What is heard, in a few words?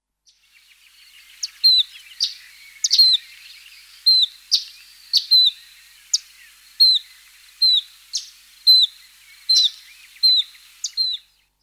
Semicollared Flycatcher sound